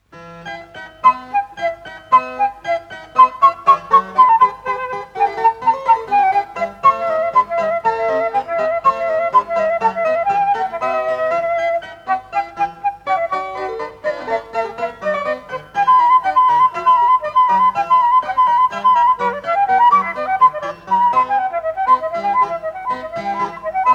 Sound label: Creamsonata E minor - III. Largo; Op.1,1